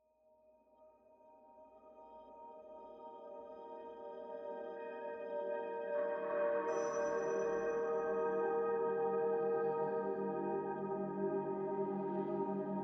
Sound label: Water Sounds for Sleep Lightning Thunder and Rain Storm